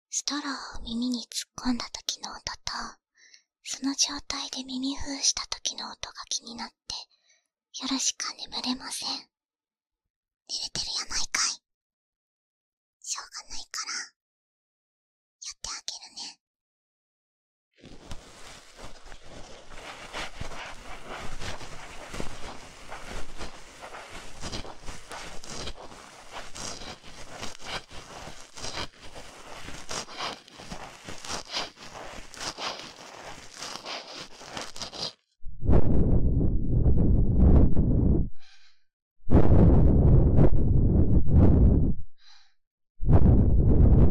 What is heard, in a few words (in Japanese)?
ストローをお耳にぶちこみました